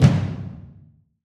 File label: Mid Perc; Drums; One Shots; Ghosthack; Drum Mega Bundle Demo; Quantum Force 4; Alloy